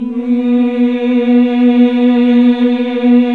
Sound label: Nuns Mmmhh; Sound & Vision - Gigapack II